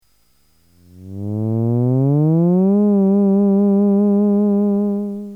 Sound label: pure, theremin